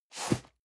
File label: cardboard box medium slide short hard; objekte